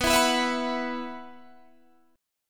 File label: B Suspended 4th, Guitar, Half Step Down